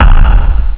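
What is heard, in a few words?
bass drum; Amiga Soundtracker sample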